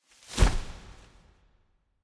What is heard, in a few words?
angelic victory; Smite; Thanatos